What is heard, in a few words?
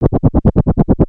copter